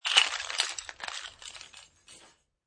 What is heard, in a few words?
Key Jingle; Footstep - Man wearing jeans and hiking shoes walking on wooden floor surface, some movement noises are heard; Footsteps; Human